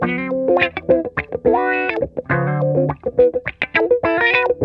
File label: Funk Guitar; Zero G - Funk Guitar